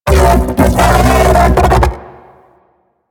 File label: Mech Ambient Vocal; Warframe; DE; Vehicles